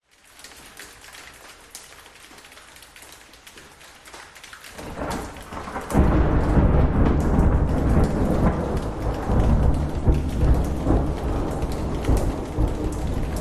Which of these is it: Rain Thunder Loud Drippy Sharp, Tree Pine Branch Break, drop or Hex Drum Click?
Rain Thunder Loud Drippy Sharp